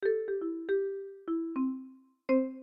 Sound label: Jingle